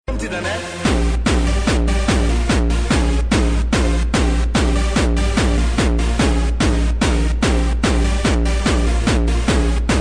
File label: Jumpstyle